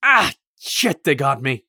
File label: hurt light; Escape from Tarkov